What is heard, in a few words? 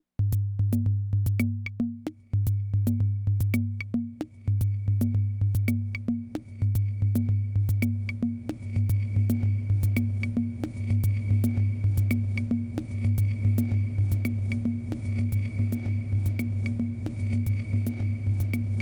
Drum Machine Reverse; Ventris